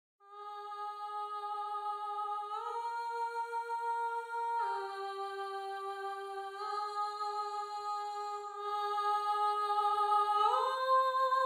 Alto, counterpoint

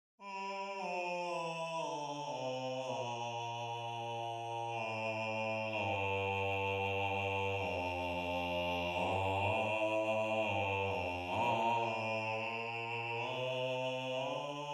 Bass

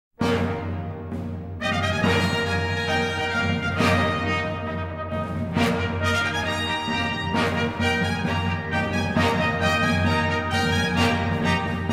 Toccata; Monteverdi; L Orfeo; Early italian opera

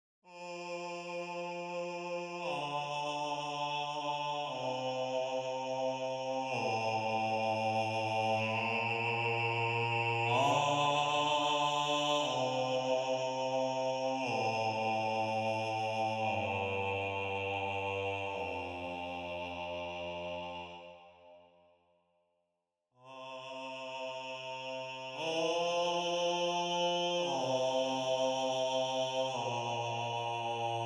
counterpoint

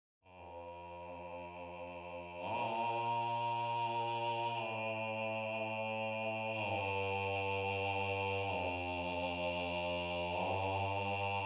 Bass

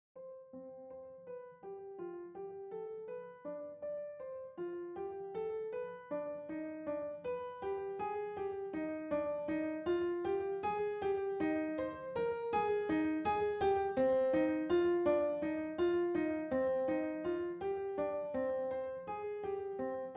Piano

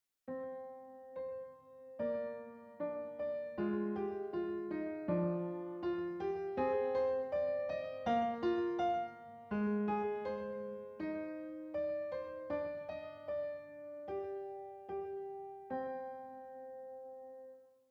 Piano

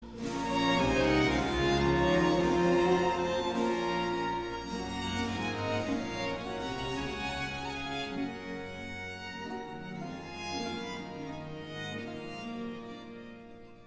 Ritornello; Monteverdi; L Orfeo; Early italian opera

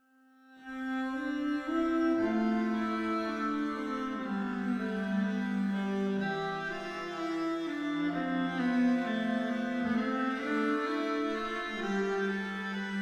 counterpoint; Artinfuser Counterpoint

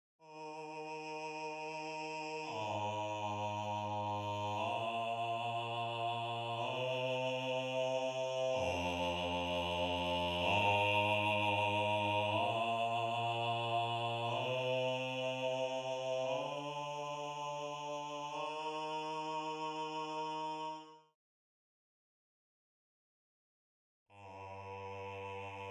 Bass

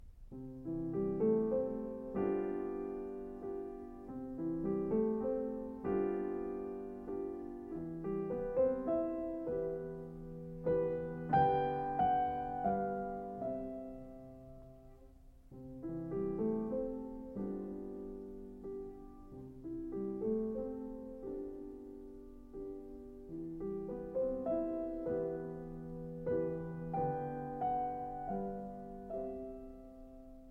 Landler in As-dur; Schubert

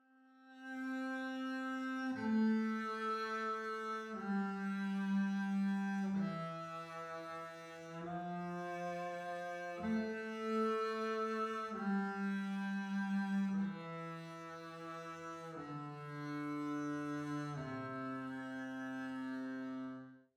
Double Bass

